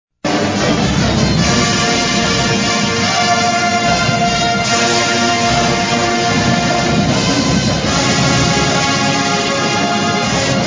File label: Fanfare, Fight Song; Hampton; HU; Hampton University Fight Song